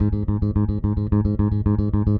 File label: Sixteenth Notes G#, Bass Scales; Construction Kit